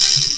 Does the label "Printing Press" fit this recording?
No